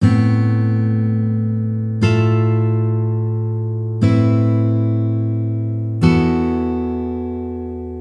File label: Unusual Spacing, Guitar Chord Shapes